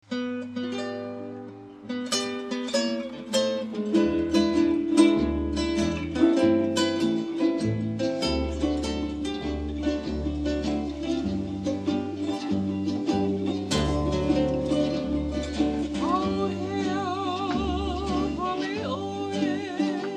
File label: paniolo